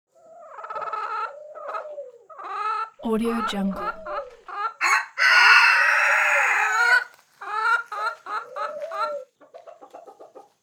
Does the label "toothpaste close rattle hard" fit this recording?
No